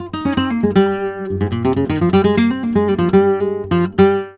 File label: Flamenco Guitar Frase; Best Service ProSamples vol.46